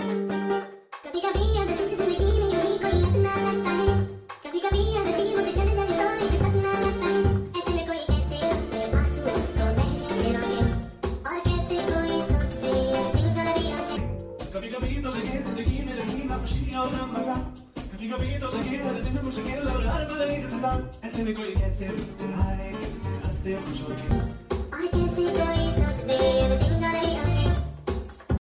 funny remix ringtone

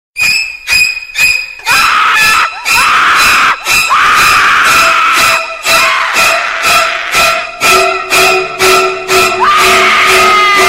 horror scream ringtone

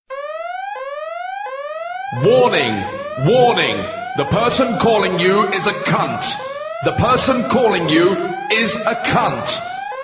rude alert ringtone

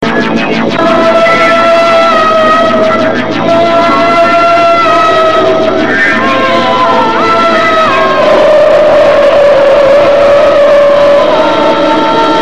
horror tone ringtone

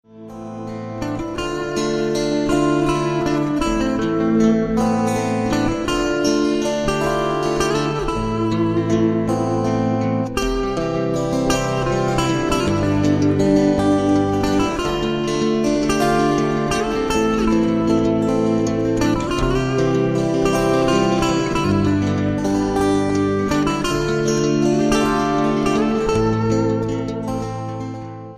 serenity guitar ringtone

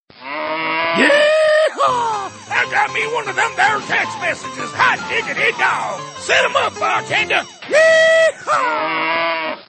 got a text message ringtone

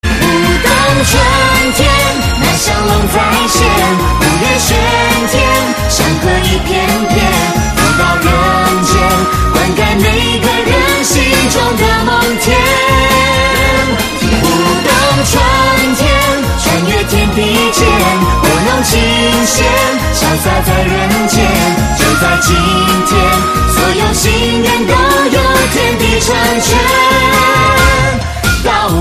chinese new year ringtone

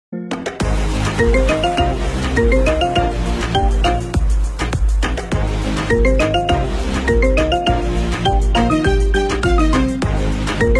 iphone 7 ringtone

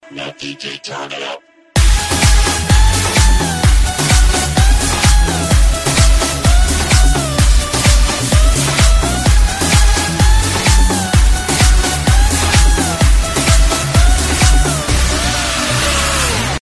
dj mix ringtone

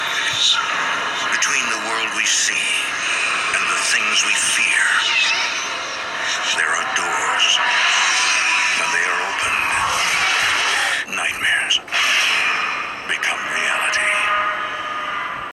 a haunting ringtone

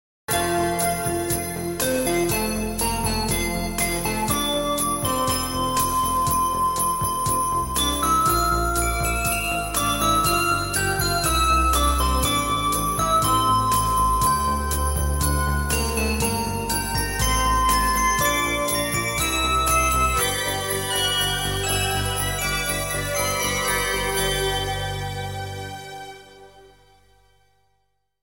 xmas jingle ringtone

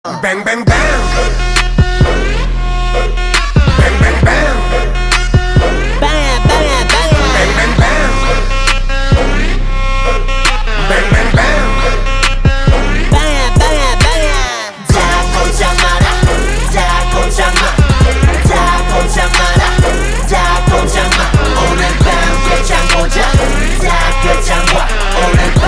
ringtone

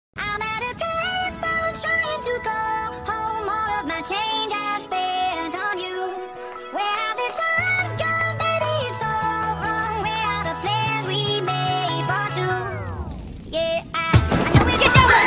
chipmunk ringtone

